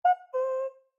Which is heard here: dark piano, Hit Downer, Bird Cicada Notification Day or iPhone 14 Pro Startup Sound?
Bird Cicada Notification Day